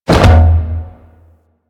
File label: Pilot Breakout Hit Two; Warframe; Amalgam Carrus